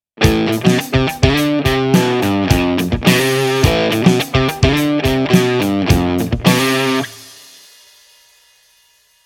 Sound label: rock riff